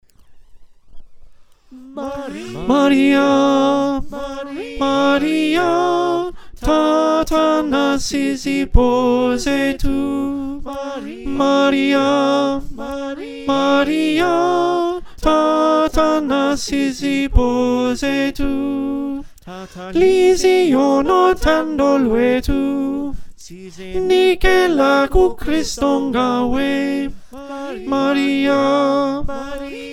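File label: alto, choir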